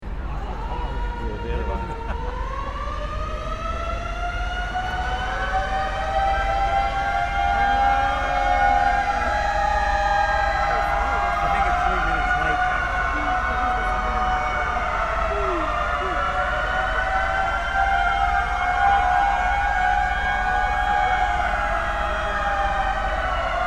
San Francisco Centennial Sirens

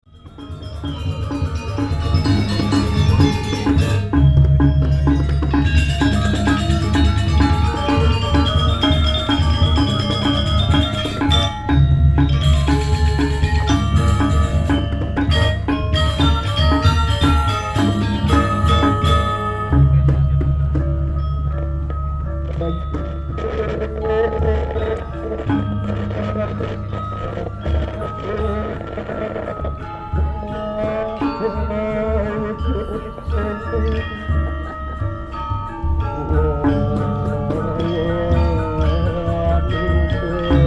gamelan squelch

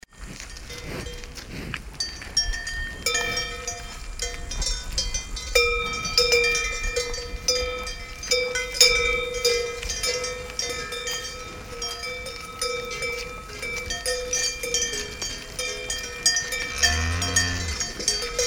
Ruhpolding Germany Bucolic Forest Cows

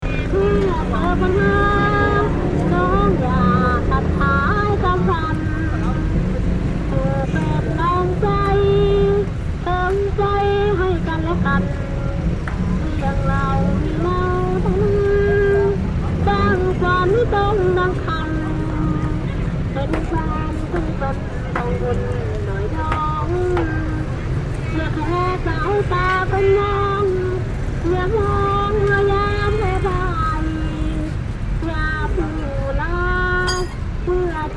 Chiang Mai alms; thailand

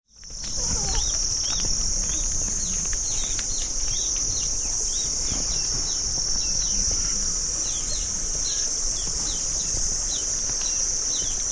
Children, vietnam

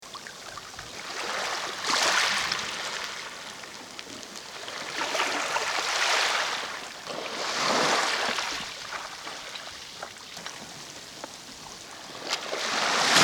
Queensland Aus Magnetic Island Bay

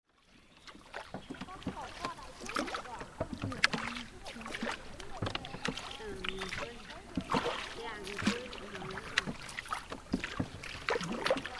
Rowing

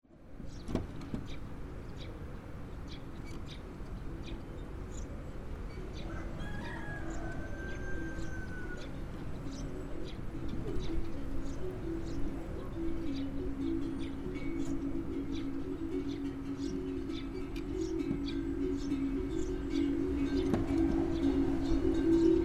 donkey train leaving marpha, nepal

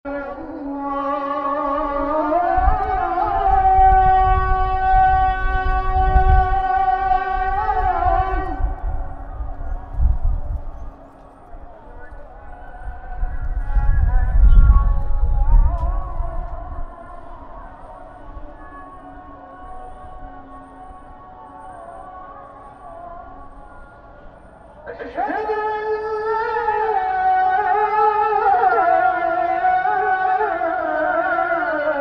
Blue Mosque Call To Prayer, Istanbul

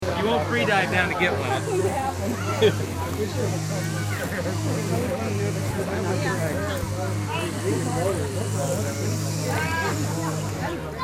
Shell Shopping, fiji

